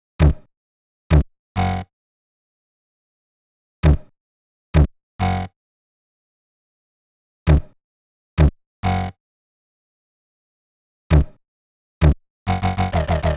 132 Em Bass, Bpm132, Complex Electro Synths; Prime Loops; Ossify

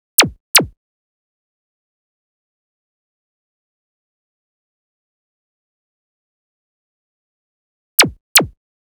123 Em Sfx, Complex Electro Synths; Prime Loops; Onyx

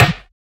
Percussive

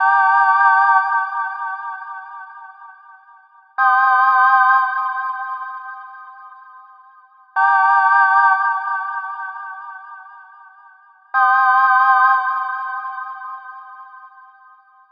127 Gm Choirs, Complex Electro Synths; Prime Loops; Shoxx

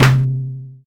Snare, Dubstep One-Shot Drums

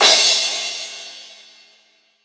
Crash, Cymbals

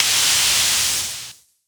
Crash, Dubstep One-Shot Drums

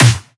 Claps & Snares

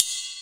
Ride, Dubstep One-Shot Drums

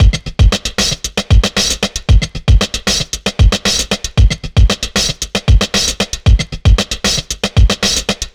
Slide, Breaks, 115BPM

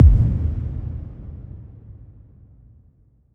FX Impact

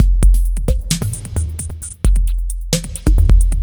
Bpm132 Short Break, dubstep drum loops

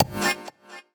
G STAB, funky; Loopmasters; Funky Underground Vol 2

